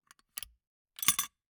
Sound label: Gumball machine, candy door, plastic, open, drop, wide; Eiravaein Works; Vaeyan IV